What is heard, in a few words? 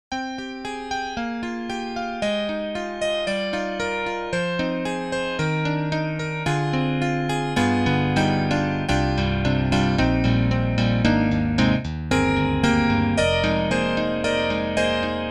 Piano 2; Native Instruments; BANDSTAND